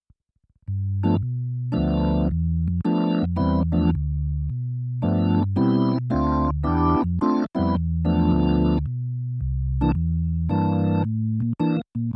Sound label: Electro Dirty